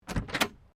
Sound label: Car Holden HD Premier 1965 door open, classic Australian cars; Vehicles